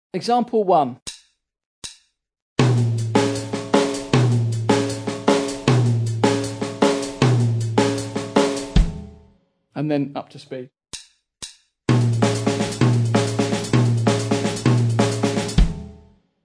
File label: drum set